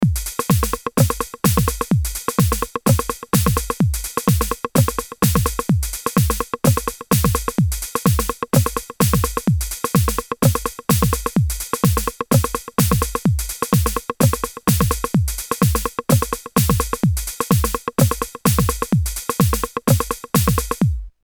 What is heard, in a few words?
AKAI MPC60